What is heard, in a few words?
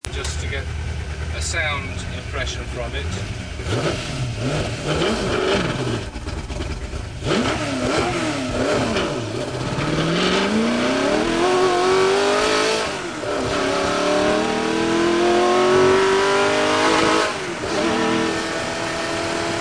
ford GT 40